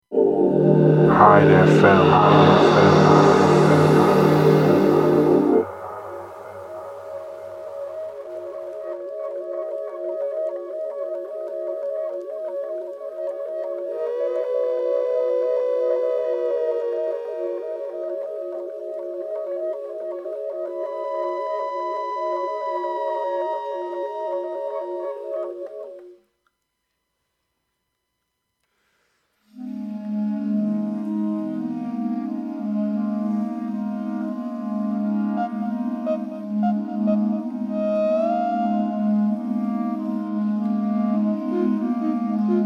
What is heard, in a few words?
eavesdrop, ambient; Eavesdrop w/ 2FA; Ambient Jazz